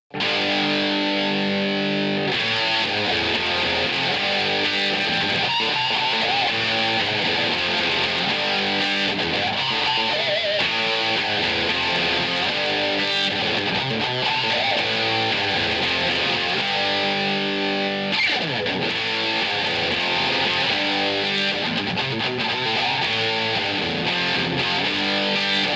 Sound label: guitar